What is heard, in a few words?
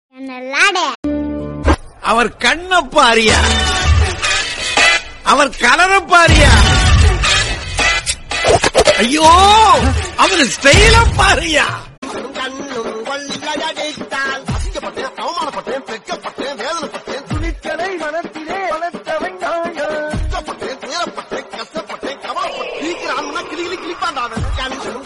birthday song for friends